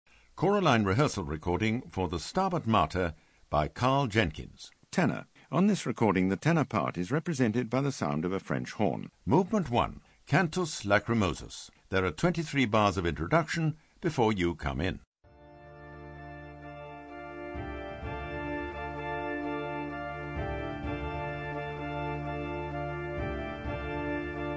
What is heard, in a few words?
Tenor; Jenkins Stabat Mater